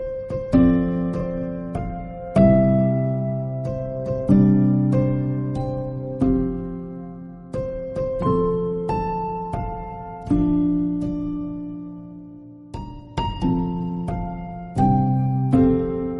happy birthday instrumental bgm

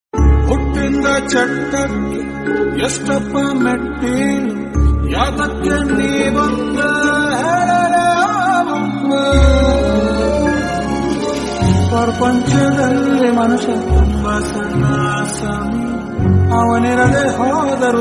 sad kannada song

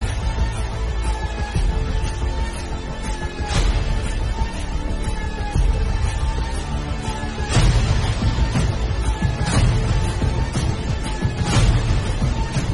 killer entry music; kgf

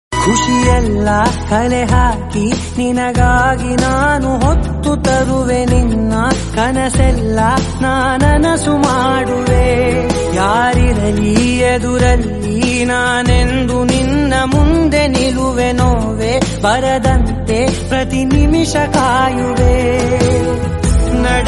love song in kannada

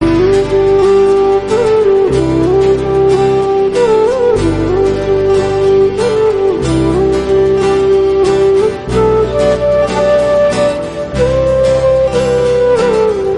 flute cover

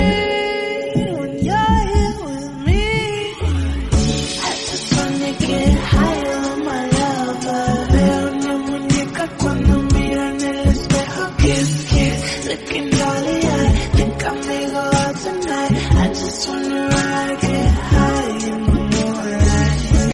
english song

